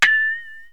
Thin Metal Poing; Wild and Wacky Sound Software; 1,000 Sounds; Beep Sounds; Bings & Boings

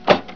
push square; Mac Mine Sweeper 4.1

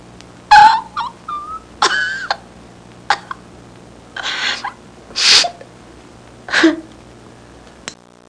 crying (woman); Sound Library 2000; Wayzata Technology; People

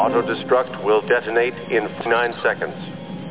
destruct; nettrek

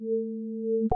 ID CLICK

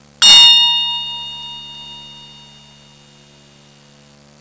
swiss bell; Sound Effects for Multimedia - Volume 1

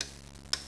SWITCH; WAV Sound Effects; houshold; utility

